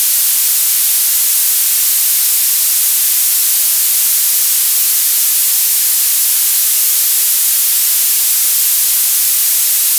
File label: noise 440